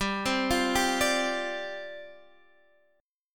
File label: G 7th Suspended 4th G down Guitar Standard; G7sus4 arpeggiated